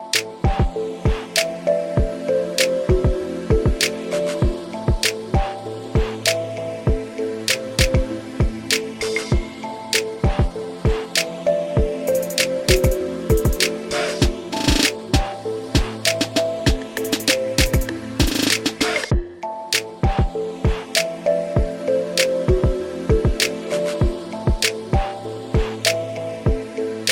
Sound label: Hip Hop Beat To Relax